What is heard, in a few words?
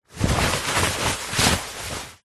foley clothes shorts swimming boardies short handling movement